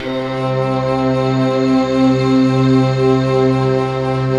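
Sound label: Japanese flute orchestra, New Age Jam; Optical Media International; Sonic Images Library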